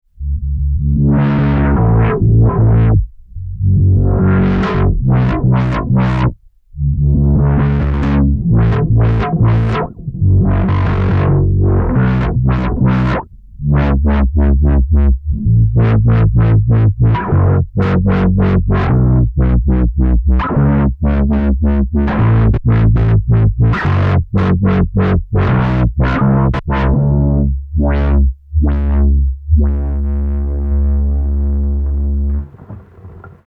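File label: Distortion Lowpass Filter; SR KAOSS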